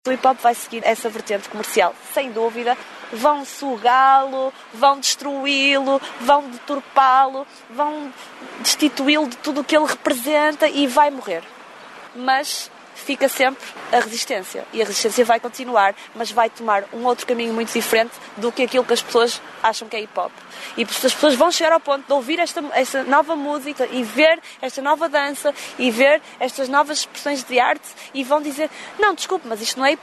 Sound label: hiphop